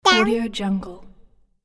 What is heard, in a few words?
cute voice down